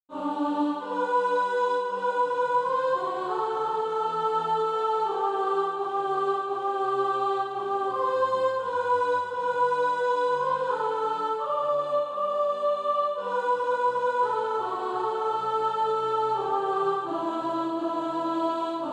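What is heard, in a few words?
soprano, Practise Music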